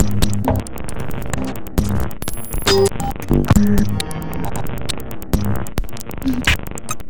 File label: rhythm